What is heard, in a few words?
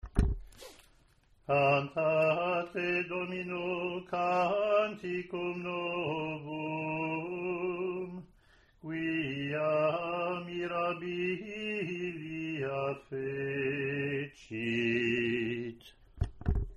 tone